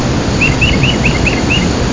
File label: Titmouse